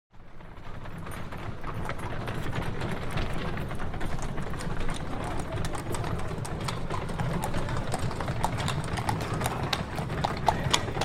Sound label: Horse Drawn Carriage By And S; Horses Carriages and Traps; Hollywood Edge Historical Series